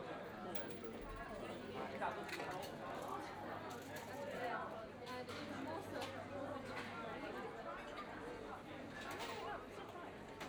restaurant, scenes; IEEE AASP

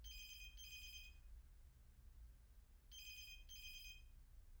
phone; IEEE AASP

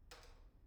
pendrop; IEEE AASP

